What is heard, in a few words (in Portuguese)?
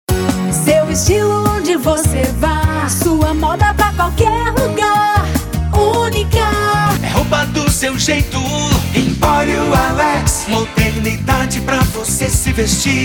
jingles moda; mundi moda